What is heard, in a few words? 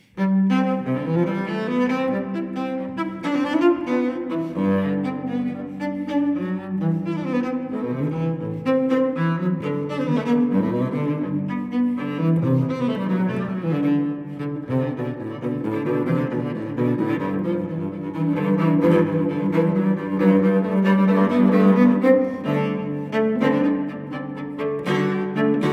Cello Suite No. 3 in C major Gigue; BWV1009; Johann Sebastian Bach